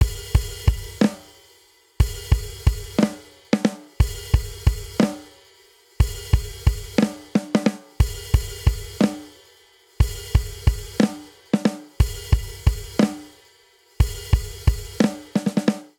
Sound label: Swing Six RnB Breakdown 6/8 90 bpm; Ableton; Factory Packs; Drum Booth